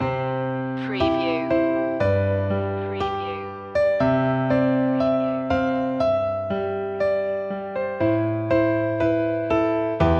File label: o come all ye faithful piano loop; media music now